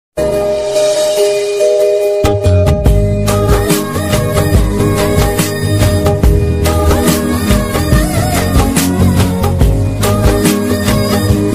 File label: Music Ringtone